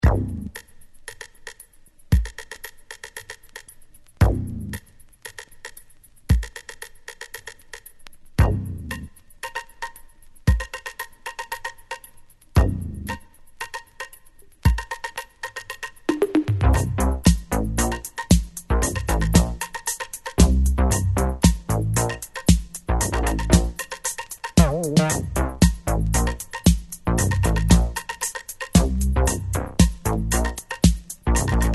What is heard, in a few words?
SOUL New Wave Funk